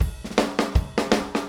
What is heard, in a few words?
160 Country Shuffle Drums; Pop Rock